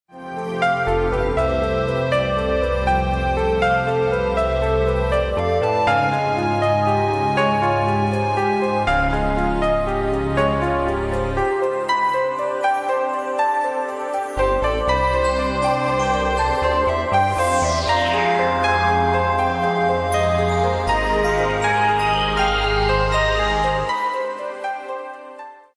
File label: newage